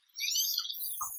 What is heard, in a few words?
Chirp zap chirp